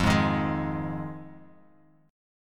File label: F Minor 7th F; JGuitar